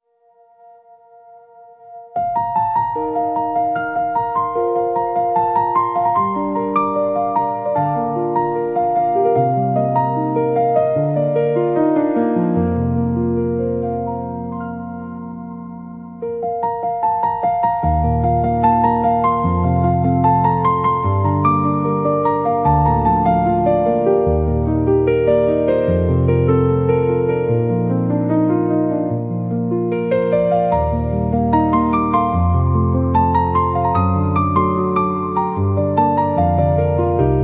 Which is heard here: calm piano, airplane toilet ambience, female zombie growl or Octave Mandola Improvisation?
calm piano